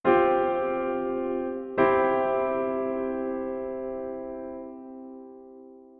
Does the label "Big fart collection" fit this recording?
No